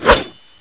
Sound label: combat knife slash; weapons